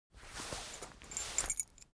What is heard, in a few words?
cloth key ring small trousers pocket fetch; foley; smartsoundfx